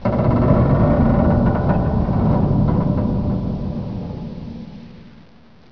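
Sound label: metal creak; de Nostromo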